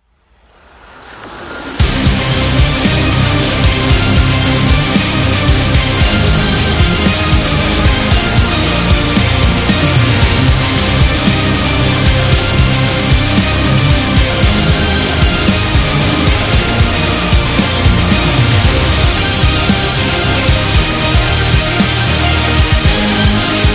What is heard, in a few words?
Rock Epic